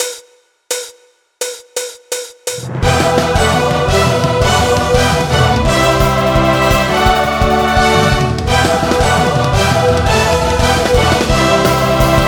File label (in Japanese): bansou